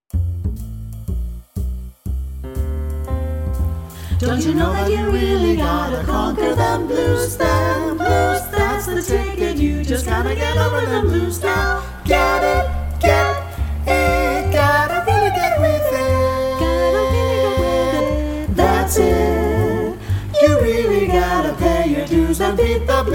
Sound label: Vocal Blues Etude NO BASS